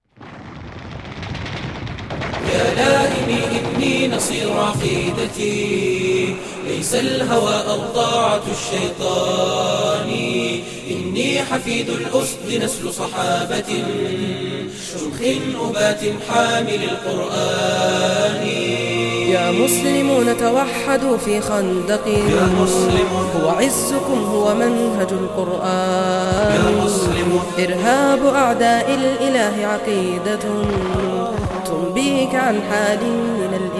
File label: Arabic Nasheed